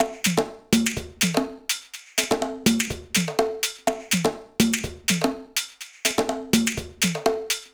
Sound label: 124 Perc